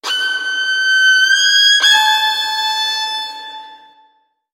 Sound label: plank germs, music mob; Left 4 Dead 2